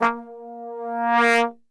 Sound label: A#1, Brass; Best Service; Brass Super Section